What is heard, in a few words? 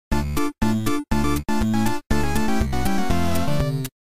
8 bit